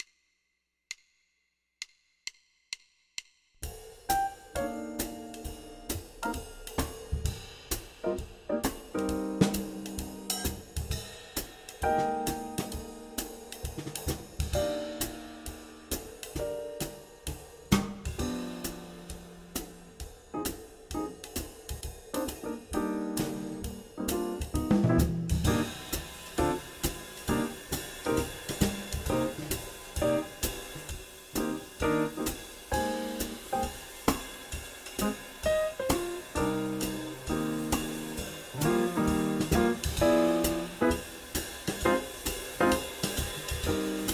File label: blues bass